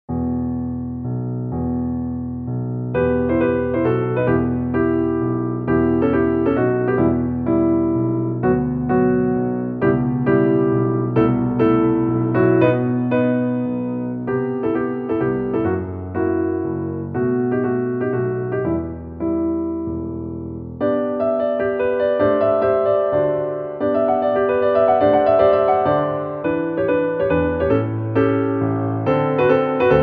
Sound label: piano; grieg